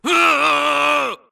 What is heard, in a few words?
grunt electro; Titanfall 2